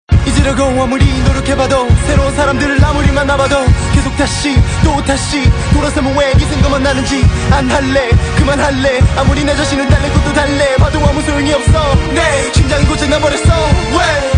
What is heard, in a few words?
heartbeat rap